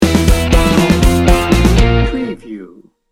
bouncy bones sting bumper

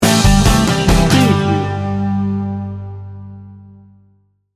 pop rock feel good sting bumper

